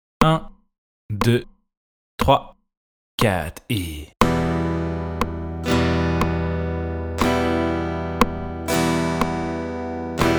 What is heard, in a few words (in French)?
Rythme syncopé 60